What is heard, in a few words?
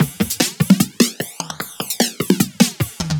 Dubstep Fill, 150bpm; Drum Fills; Ghosthack; Dubstep Sound Arsenal